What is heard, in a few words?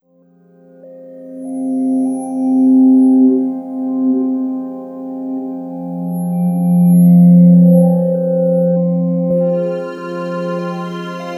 Melodic Bed; Sonic Foundry (Sony Creative Software); Slow Silhouette